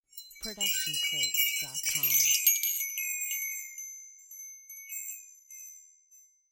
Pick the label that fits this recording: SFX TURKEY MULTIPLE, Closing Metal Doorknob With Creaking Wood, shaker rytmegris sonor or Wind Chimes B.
Wind Chimes B